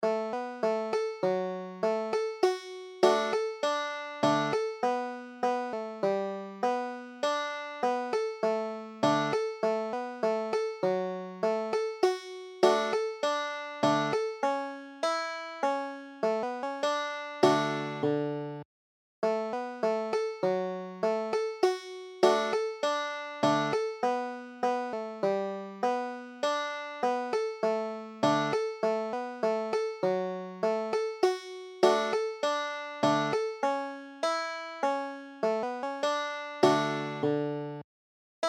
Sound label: banjo